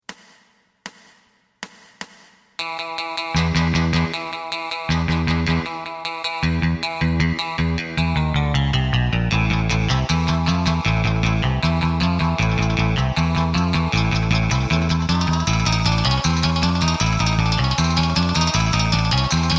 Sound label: drum